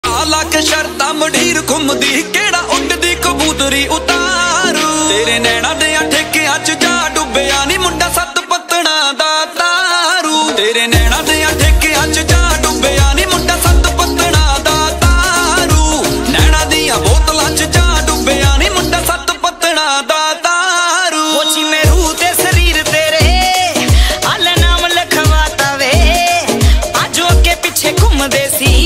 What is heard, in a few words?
punjabi ringtone